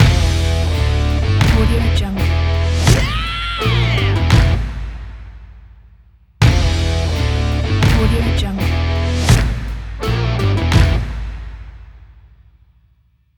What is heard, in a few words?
strike rock logo